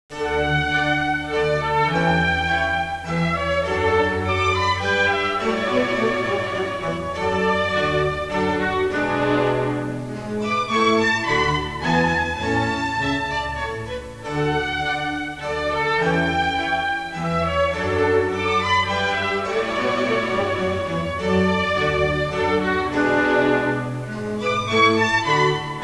Classical Baroque

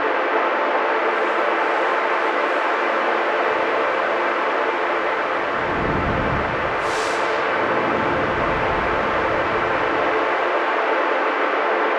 80 F Ambience, Ambient Synth Loops; Aerosol

